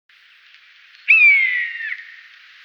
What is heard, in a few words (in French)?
buse variable